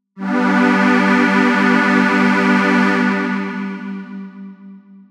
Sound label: Epic Warm Pad, jup8